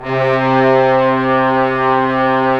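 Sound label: brass trombones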